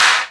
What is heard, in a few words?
Snare, Ensoniq ASR-X